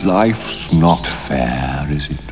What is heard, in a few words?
lose